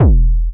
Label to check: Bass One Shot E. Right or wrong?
Right